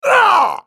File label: Twitch dying